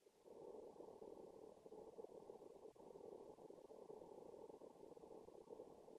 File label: sine 440Hz R inverted